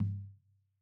TOM LOW, LOW TOM; Sonimus; A-CONSOLE; A-EQ

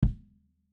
KICK HIGH; vintage console bundle

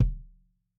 MED KICK, drum; Sonimus; s series drum pack; STONEQ 4K; SATSON; SATSON CS

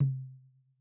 TOM LOW, LOW TOM; STONEQ 4K; SATSON; SATSON CS

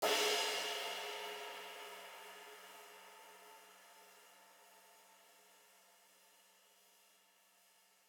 CRASH RIVETS HIGH; vintage console bundle

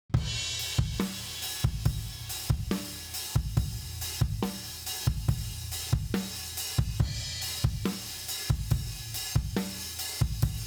Drum Bus Processing ON; Sonimus

